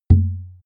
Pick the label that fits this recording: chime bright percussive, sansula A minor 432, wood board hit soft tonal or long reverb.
wood board hit soft tonal